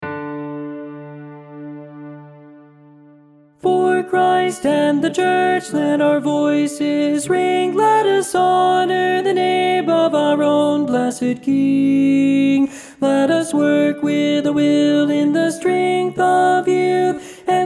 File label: ALTO